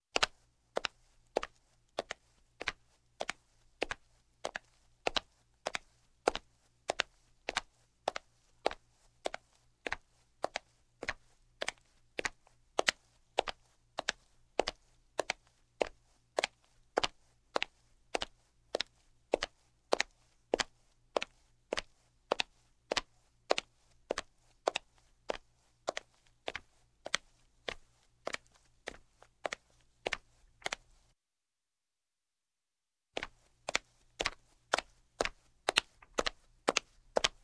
Footsteps, Linoleum - Female; Sound Ideas 2000 Series

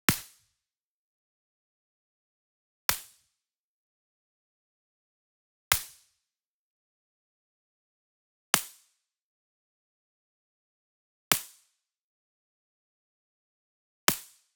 Whip Crack Hit Near; SWEETENER; boom library; Cinematic Strikes Construction Kit

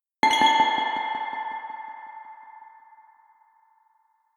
Reaktor Drop; Inquisitor 3

